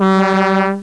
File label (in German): Truck-Hupe; World Racing 2